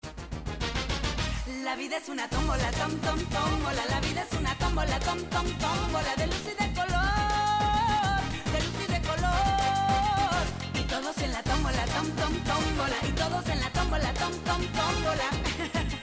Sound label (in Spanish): theme voz; tombola